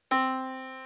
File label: yamaha Disk